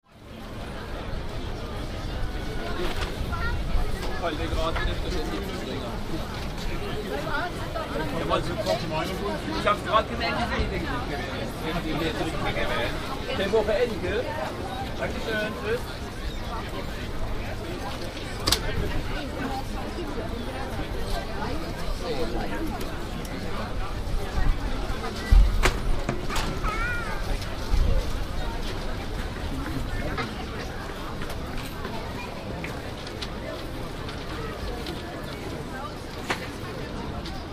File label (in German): Marktplatz